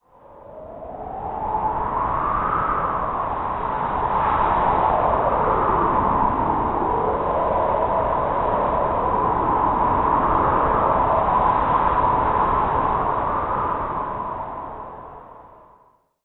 Wind; Acceleration of Suguri

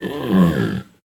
whimper; ice age scrats nutty adventure

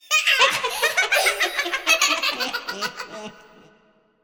Laughter; Yu-Gi-Oh! Master Duel

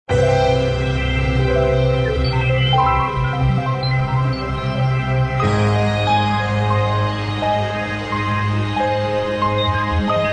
Chili Without vocals; Rabbids Big Bang; Android iOS Windows gamerip 2013

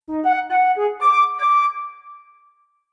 flute; undead overlord linux macos windows gamerip 2014

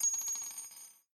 50cal Land; Red Hot Vengeance

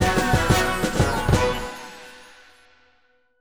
New Celebration; Cosmic Defenders

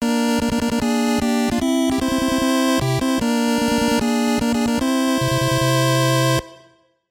Circuit Victory

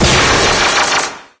Super Colour Bomb; candy crush saga gamerip

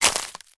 foley footstep single dried long grass

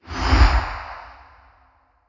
Game Open; Golf Blitz 1.12

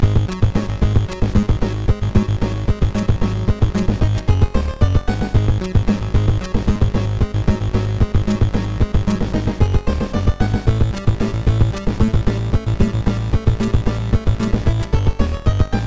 Furnace Chamber; Esper Dream 2; nes

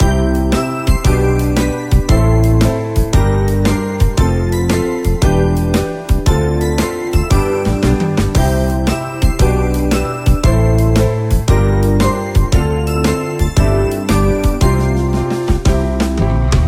BGM MUSEUM

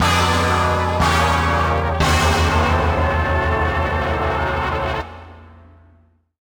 Mini Game Lose; frogger ancient shadow

